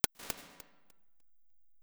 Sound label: 1.8s Stadium Delay, Impulse Responses; Medium Spaces; Indoor Spaces; Apple